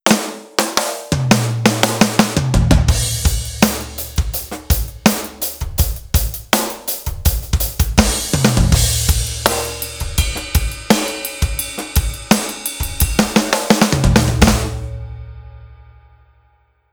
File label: trap set drum